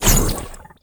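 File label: javelin throw, attack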